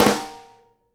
snare GLASS, Live Snares

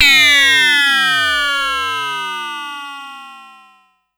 Pollard Syndrum

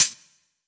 Hat, Hi Hats

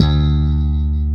5STR BASS D2, Bass Guitar; AKAI S6000 CD-ROM - Volume 1

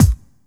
BD, Dance Drums; Best Service ProSamples vol.15; AKAI

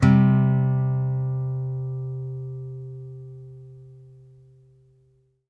5ths C3, Blues Guitar; ILIO; Fretworks

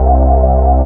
BAS, Eurotech; Sounds Good

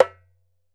ASHIKO, African Perc

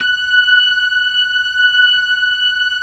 LO MAN V R, ORGAN LOWER MANUAL

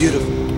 FX, Futurist Drum & Bass

